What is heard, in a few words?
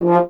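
French horn, Solo Instruments; Miroslav Vitous; Solo Instruments VOL-2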